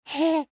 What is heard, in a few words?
Human Giggle, Giggle Male Toddler; Blastwave